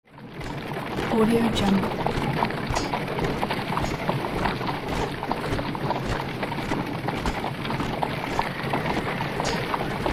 medieval army pass by

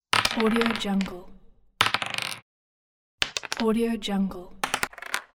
dice roll; audio therapy

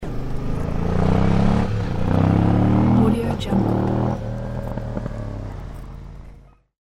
distant motorbike drive away

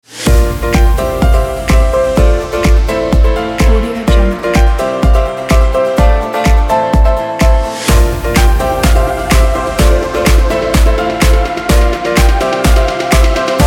edm

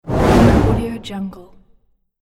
uncovering whoosh

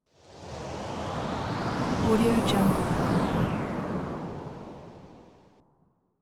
heavy wind gust

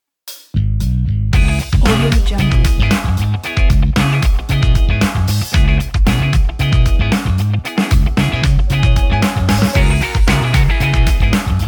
funky jazz rock ident

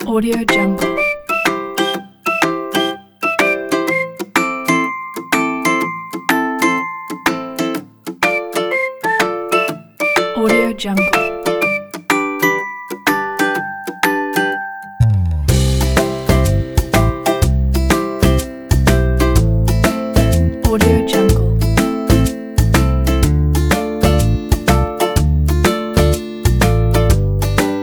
summer ukulele

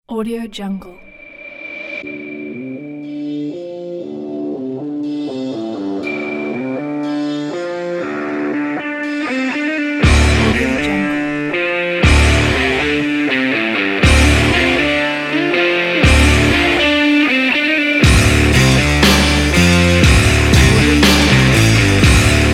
dirty southern rock

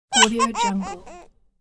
cartoon jeer dolly laugh

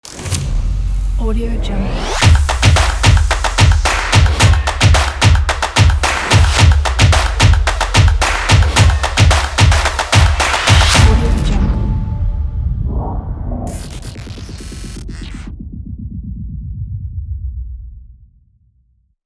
energetic drum logo

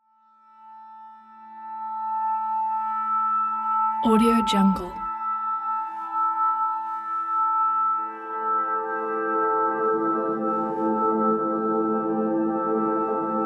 drone ambient

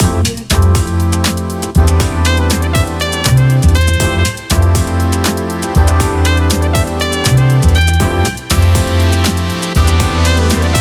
neosoul trumpet ident

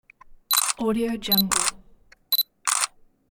camera shutter

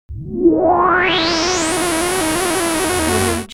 game fx working robot; smartsoundfx

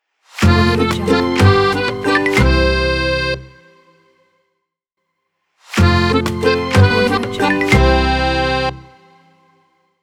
country folk short logo

